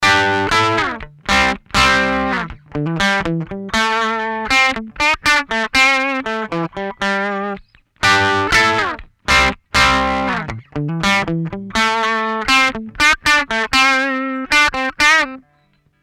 two notes torpedo reload reamp reload loadbox output clean amp clean no cab